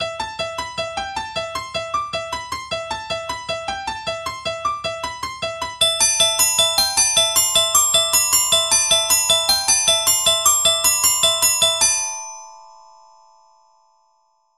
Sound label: tubular Bells